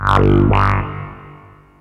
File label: Sweep Bass, Drum & Bass; Fluid Dynamics